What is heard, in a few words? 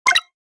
Digital click